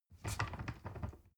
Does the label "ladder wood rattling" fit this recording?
Yes